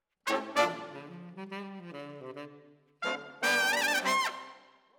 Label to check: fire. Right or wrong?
Wrong